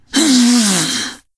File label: Wound; Ionar